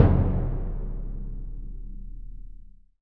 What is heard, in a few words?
PRC CBD, Orch Bs Drum